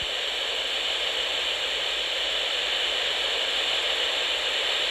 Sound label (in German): Weißes Rauschen